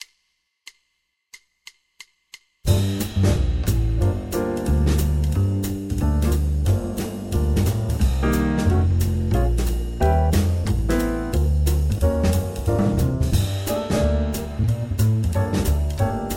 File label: Backing Track; Riverside Records